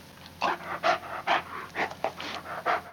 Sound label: dog idle look